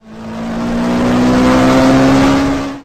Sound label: car accel; SuperTuxKart